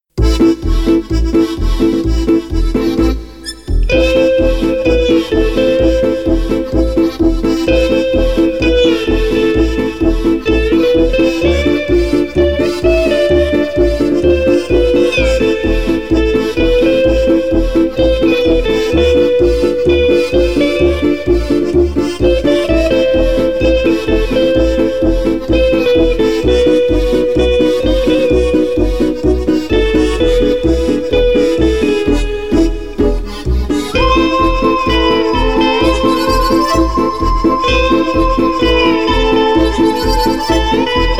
Polka

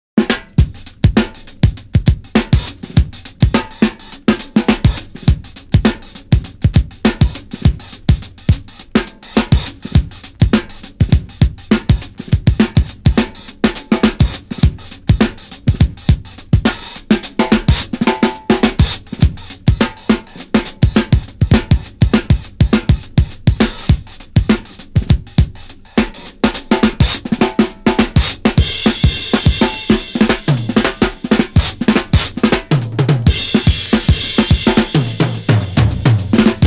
Zouche Drums

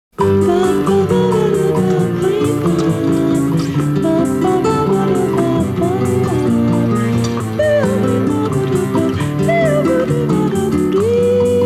Soft Bossa

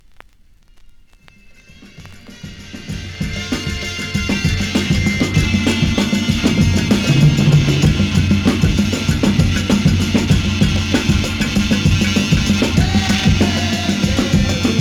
Ringing Bells

